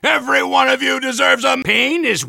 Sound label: soldier cheers